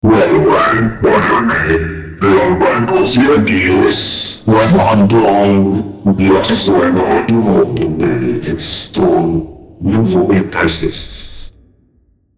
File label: create alarming music not